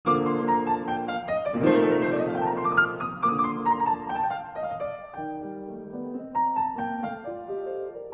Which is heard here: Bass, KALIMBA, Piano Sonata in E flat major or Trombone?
Piano Sonata in E flat major